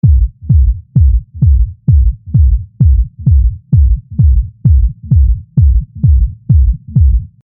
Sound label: kick thick kick